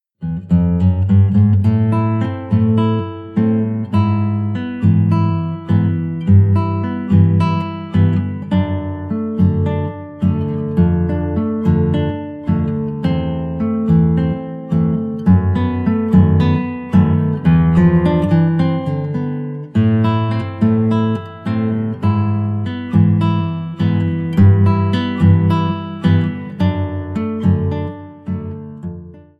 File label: milonga